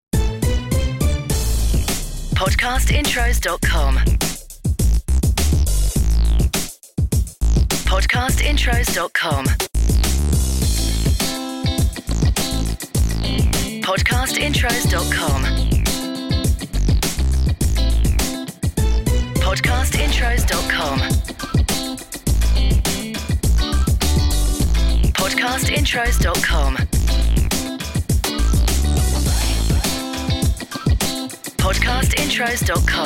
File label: HIP HOP; Podcast Watermark